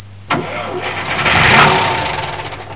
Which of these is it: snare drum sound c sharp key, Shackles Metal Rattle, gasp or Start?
Start